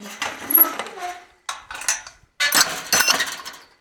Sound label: Gate Metal Fence Close; Doors